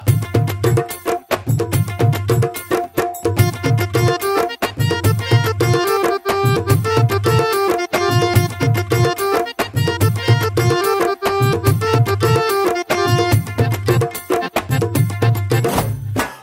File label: Instrumental Tone